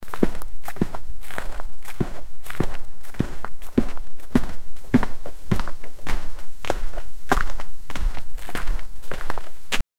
king kings faimly walking

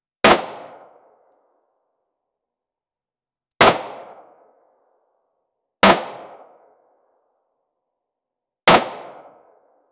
shooting from the tank

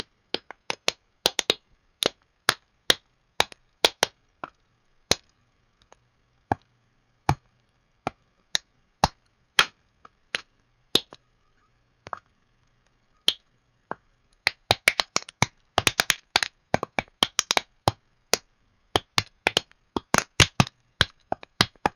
popping of popcorn starts; snack

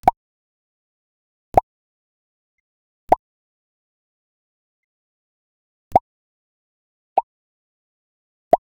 a short soft cartoon styl, boop

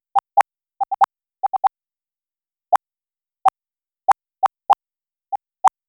blip

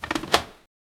open inventory

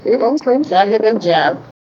correct answer for a trivia

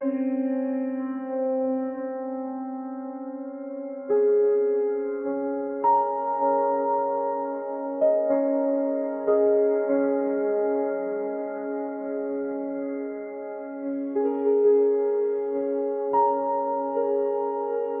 gentle sunrise soundtrack, bird calls

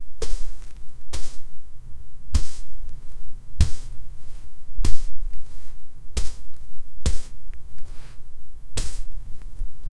barefoot on the floor

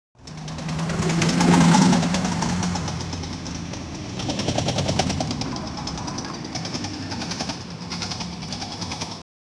riding Honda Activa scooter; sound effect

